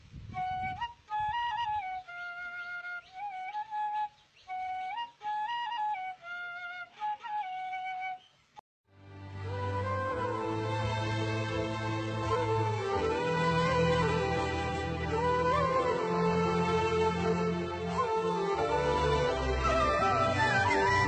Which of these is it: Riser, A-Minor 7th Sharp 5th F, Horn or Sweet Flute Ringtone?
Sweet Flute Ringtone